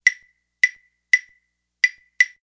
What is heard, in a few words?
clav toot, Musical Instruments